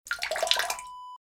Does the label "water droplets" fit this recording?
Yes